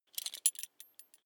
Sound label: metal belt buckle clatter regular